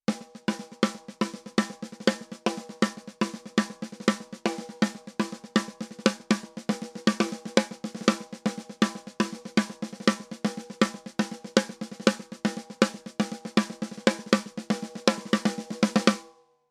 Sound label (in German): API Audio 2500 Snare Drum 40 Prozent Kompression Cross